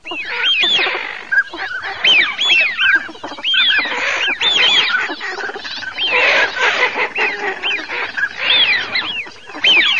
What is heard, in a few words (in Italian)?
fischio fis cod